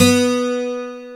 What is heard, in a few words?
ACOGTR; AKAI S6000 CD-ROM - Volume 1; AC GUITARS